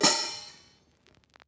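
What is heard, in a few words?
open hi-hat; eddyflux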